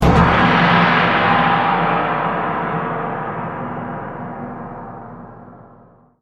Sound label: gong